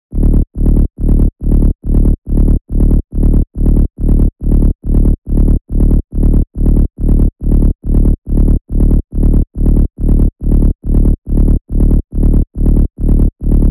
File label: Synthetic Bass Dm 140BPM, Techno; Rave; Melody Kits; Stems; Ghosthack; 7 Free Rave Melody Kits 2025